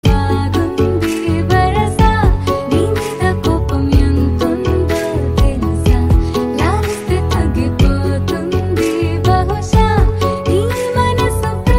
Female Version Ringtone; Telugu; Atithi DevoBhava

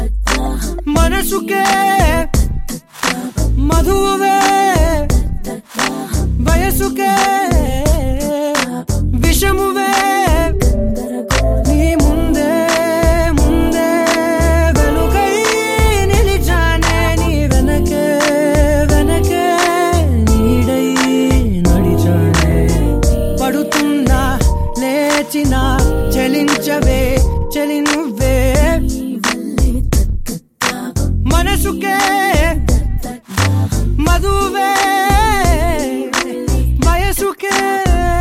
Male Ringtone; Telugu

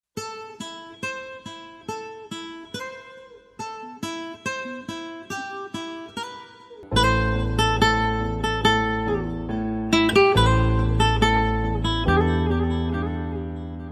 Guitar BGM Ringtone; Rang De; Telugu

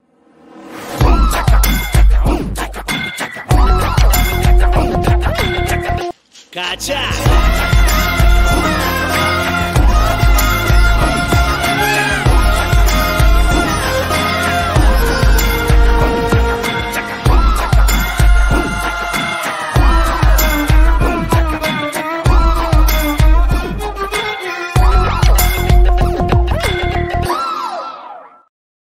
Kannada Bgm Ringtone

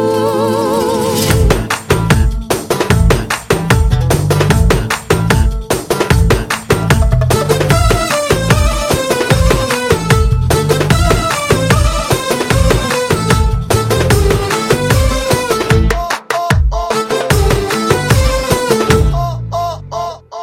Music Ringtone; Mirchi; Telugu

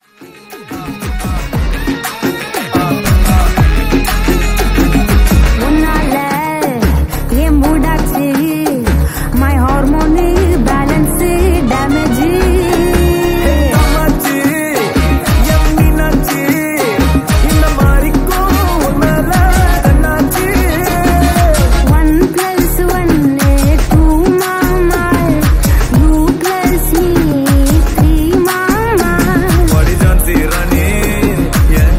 BGM Ringtone; Maari 2; Tamil

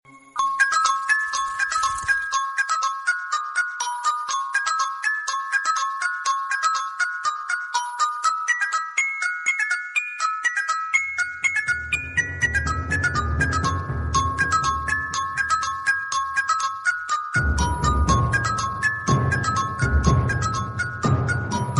Clock Ringtone; Telugu

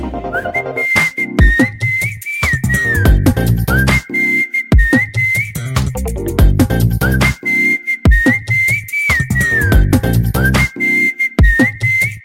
Whistle Tone; Drushyam; Telugu

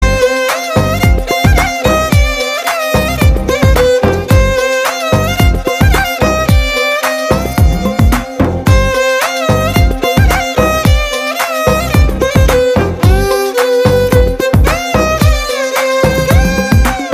Violin Ringtone; Vinaya Vidheya Rama; Telugu

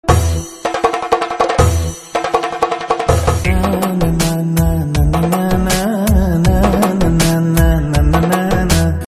Drum Beats and BG Humming Ringtone; Telugu; Shailaja Reddy Alludu

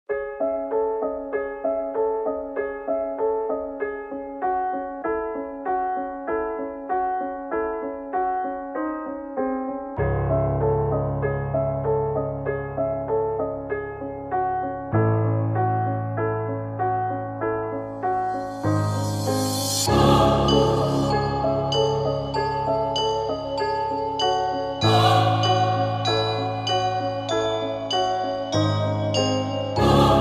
Round One Instrumental Tone

